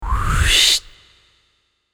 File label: Woosh